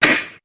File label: barrer Launcher, Weapons; Game Kit Controller